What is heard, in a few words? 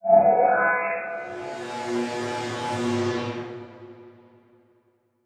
Title Transition Electrical Power Down; Production Element Title Transition